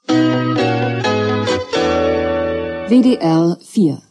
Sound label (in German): nachrichten, jingles